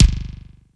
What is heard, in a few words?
DLY KICK, PLASTIC DRUM; Crypton - DrumBase5000